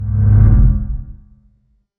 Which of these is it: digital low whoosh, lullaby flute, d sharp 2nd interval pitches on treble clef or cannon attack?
digital low whoosh